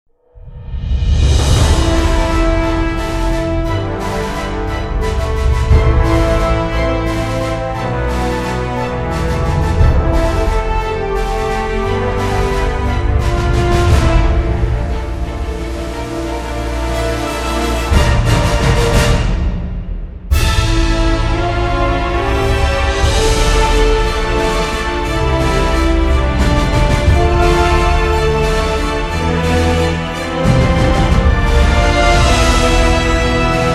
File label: Orchestral Cover; Frozen II